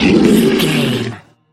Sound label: troll monster death low